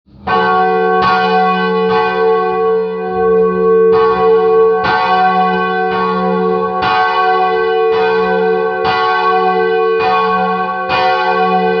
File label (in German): Glocke groß alle; Bieberstein